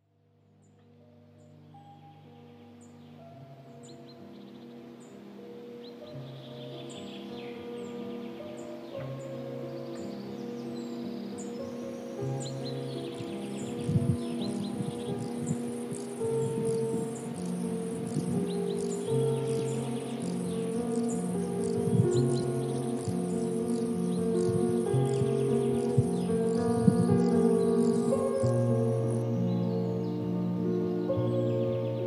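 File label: Nature Sounds - Autumn Nature. Sounds And Noise Of Nature, Forest; Zvuki Prirody; 3 Sentiabria. Osenniaia Priroda. Zvuki I Shum Prirody, Lesa, Dozhdia, Mori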